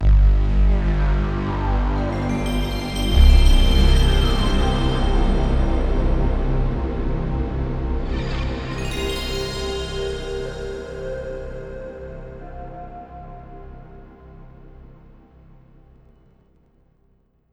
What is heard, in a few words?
Bootup, PlayStation; PlayStation BIOS; PS1